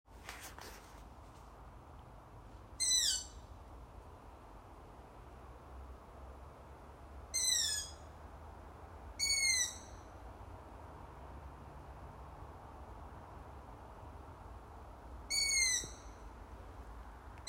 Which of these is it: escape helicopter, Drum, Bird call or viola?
Bird call